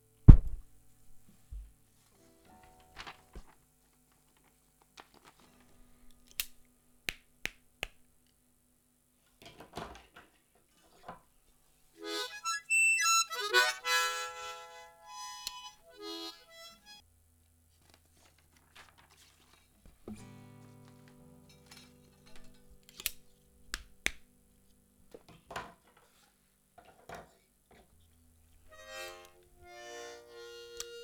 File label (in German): Nebengeraeusche